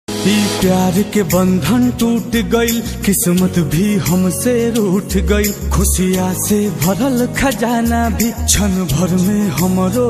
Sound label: Bhojpuri ringtone sad